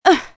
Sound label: Ugh; Krystal